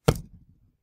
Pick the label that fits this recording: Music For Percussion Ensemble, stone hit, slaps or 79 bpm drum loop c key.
stone hit